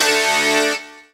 SYN Q-Guitar; Best Service Gigapack 1

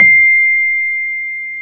PNO, Electrics, Rhodes; Club-50 - Foundations Roland

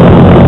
robot; ST-XX Amiga Soundtracker Sample Packs

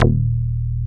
REZO BASS; Masterbits; Dance Trax

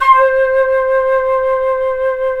FLT FL OCT, C Flute FX, C Flt OctAtk; Roland LCDP04 Orchestral Winds; 90 s Sample CDs

